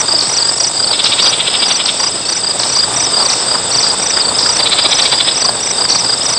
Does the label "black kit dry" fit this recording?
No